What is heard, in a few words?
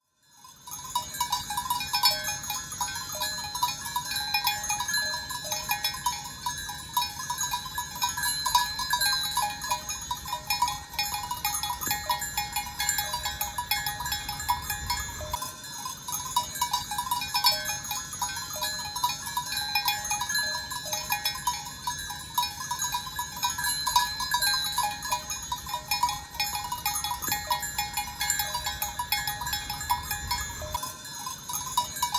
Sardinia; Symphony of sheep bells, near Telti, Sardinia on 10th July 2022; Telti, a mountain town outside of Olbia; I was travelling with my family on the island of Sardinia